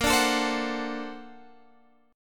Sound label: A sharp Augmented Major 9th, Guitar DADGAD; 20,17,19,17,21,x